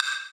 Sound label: Wood, Block Stick; Equipped Music; Loungin House